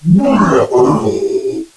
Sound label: puke; bernard